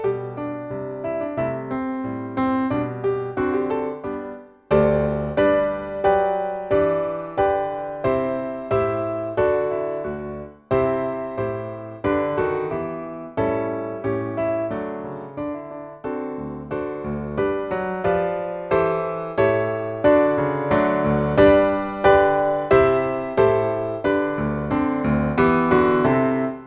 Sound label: accomp; ye mariners